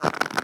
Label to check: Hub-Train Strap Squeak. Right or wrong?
Right